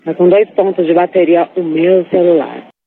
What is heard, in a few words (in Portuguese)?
dois pontos de bateria